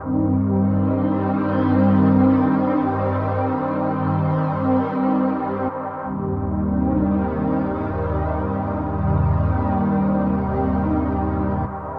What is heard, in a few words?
synth pad ambient 160 C; Sphere